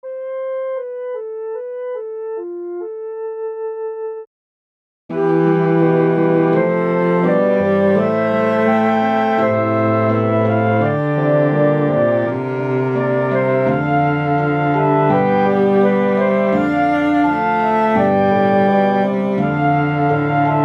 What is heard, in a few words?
relaxing